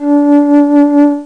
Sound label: FLUTE; Atari Mega Archive - Volume 1; music